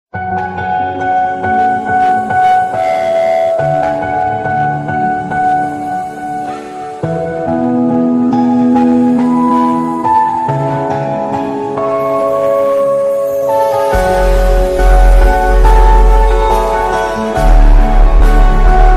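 cover background music video song trending kannada viral music; upadyaksha movie; karnataka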